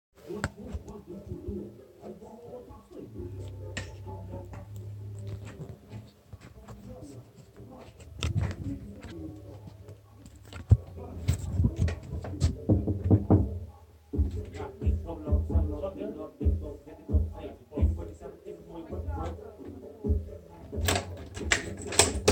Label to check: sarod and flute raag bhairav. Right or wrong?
Wrong